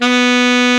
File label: SAX B2; Best Service Alpha Dance vol.2